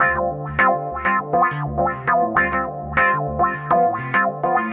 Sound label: Electric Piano, Loops, Keys